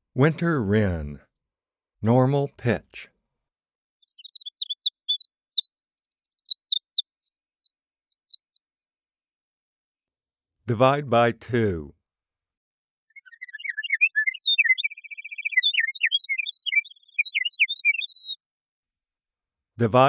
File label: Winter Wren